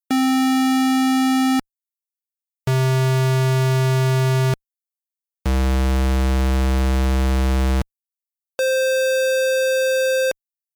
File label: squarewave